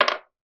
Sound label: technology headphone jack adaptor put down on table